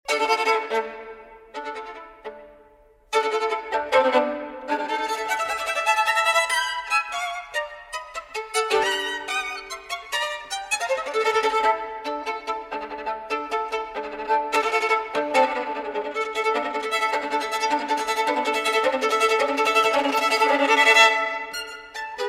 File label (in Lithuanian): Koncertas smuikui solo; Balsys